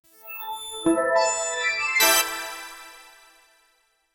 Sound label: a smooth technological, business